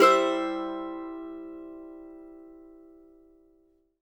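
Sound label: MANDO MAJ C major down, Right; Sampleheads; Whole Lotta Country 1 & 2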